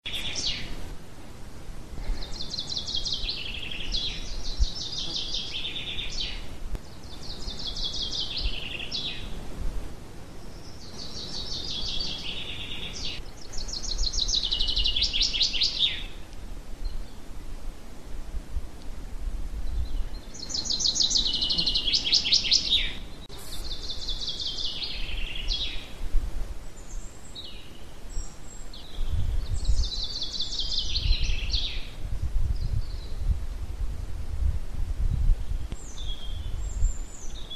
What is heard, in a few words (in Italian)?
uccelli pineta; ardenno